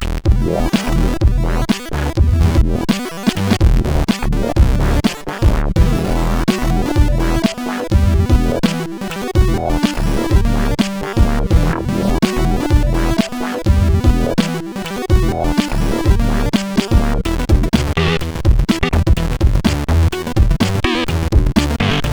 sid CSG8580R5; Drowning-A ProgStep Adventure

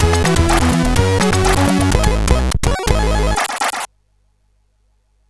sid CSG8580R5

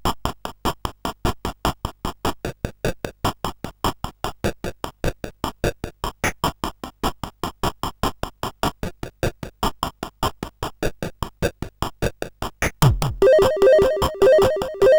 sid CSG8580R5

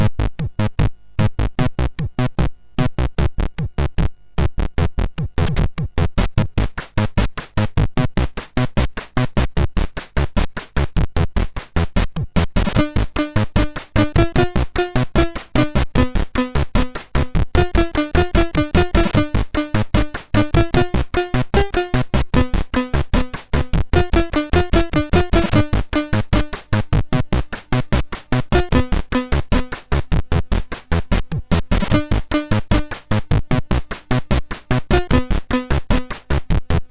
Sad End, sid MOS6581R4

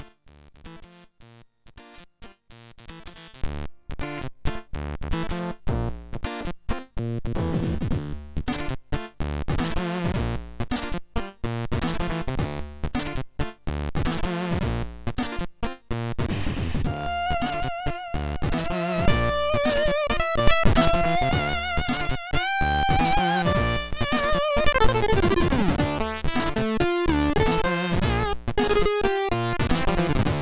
Mother Funk, MOS6581; Mother Funk Signal

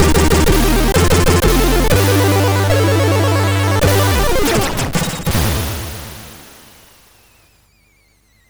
intro, MOS6581R4

